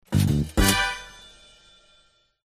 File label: win; new-years